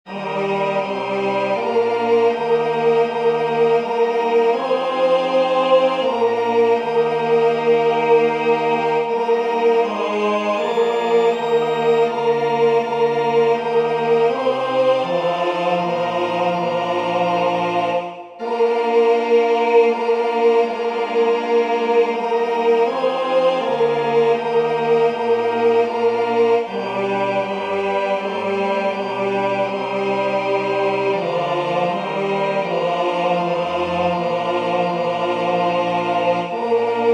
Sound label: Offertory tenor; singing divine service iii in parts